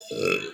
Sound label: burping boy